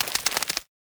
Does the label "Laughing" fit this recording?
No